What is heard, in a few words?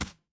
feedback achievement